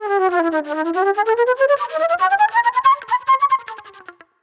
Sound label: Pop Flute